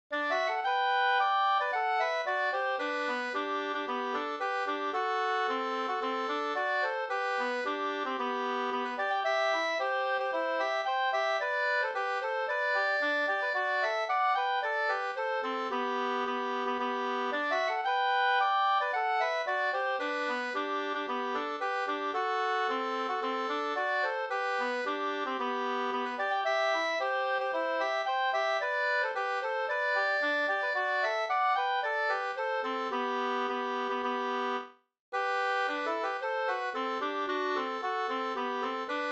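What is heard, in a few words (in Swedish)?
brudmarsch 2 stämmor; Bruastregen e Filip Parson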